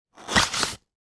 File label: household medicine instruction pamphlet take out of box